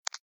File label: Lock